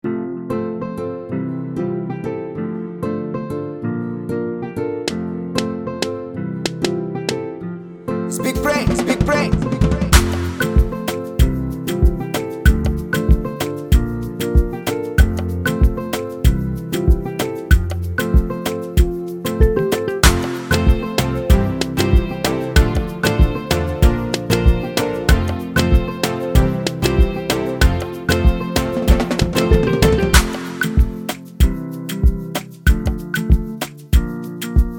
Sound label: Instrumental; Big Brain